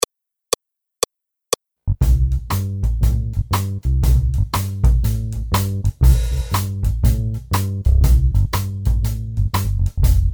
boogie Accompagnement seul; Jazz

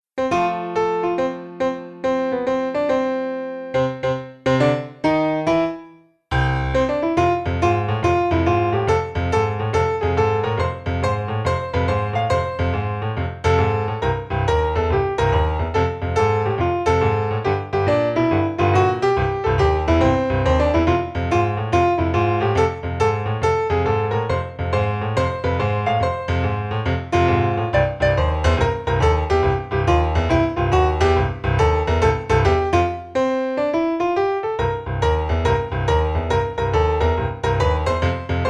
Piano seul; Jazz

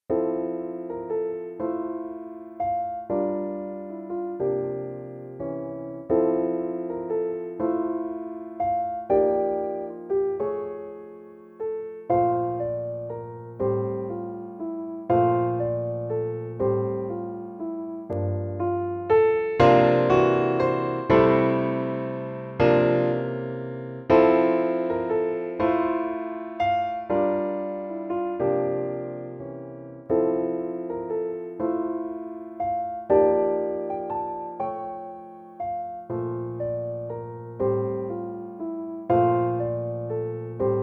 Jazz flavor waltz Piano seul

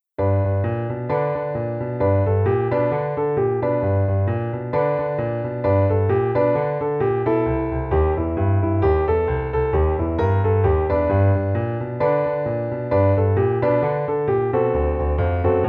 boogie Piano seul

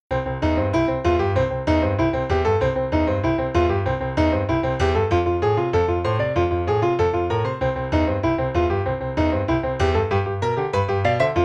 Riff Rock boogie, Piano seul; Jazz

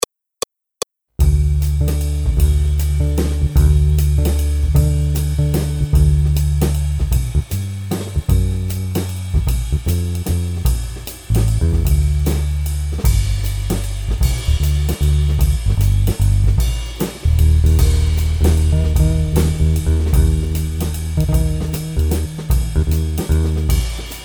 jazz waltz Accompagnement seul

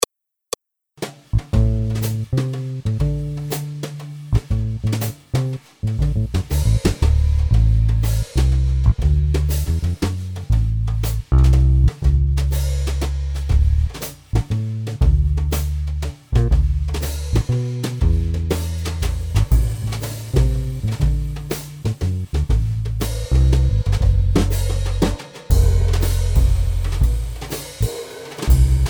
Accompagnement seul; Jazz

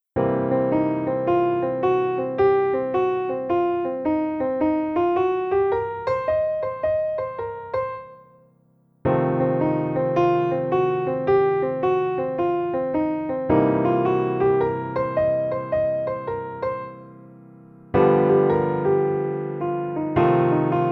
blues Piano seul; Jazz

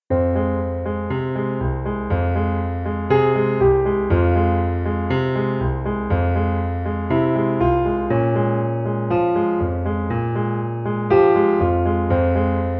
Green tango Piano seul

